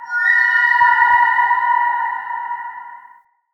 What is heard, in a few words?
Root Cave Mysterious Whistle; Ambience; Neathuns Root Cave